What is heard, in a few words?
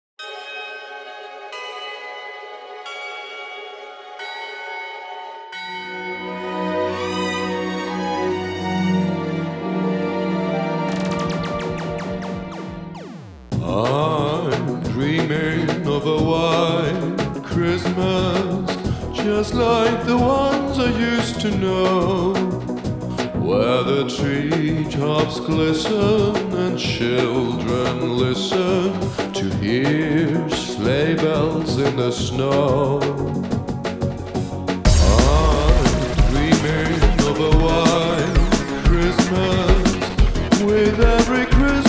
(Gabber Version)